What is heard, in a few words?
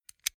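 Tools Monkey Grips Opening Metal Movement Clicks; Hand Tool Sound Effects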